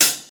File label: Dark Tone Hi-Hat A Key, Royality free high-hat sound tuned to the A note